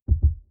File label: lines heartbeat; DraculasCastleWD; wazdan